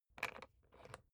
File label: briefcase plastic latch lock key turn; industrie wirtschaft; bueroarbeit